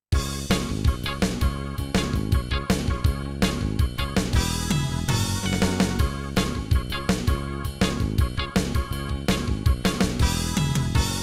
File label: SC880